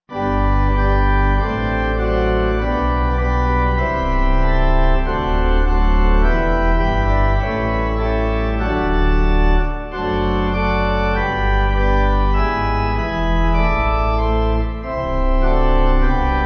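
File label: Pipe, hymn backing; Heavenly Father; Salvator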